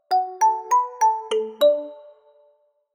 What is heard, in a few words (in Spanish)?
AUDIOLOGO SOLO; ZENU